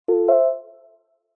multimedia game sound soft warm subtle positive short